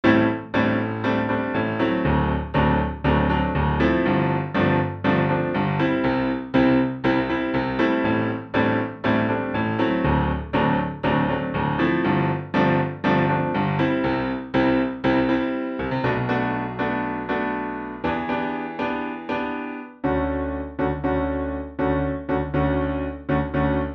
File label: pop 1970 UK